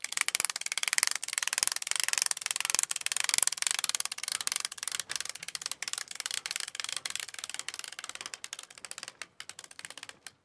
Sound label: Crank Winch Handle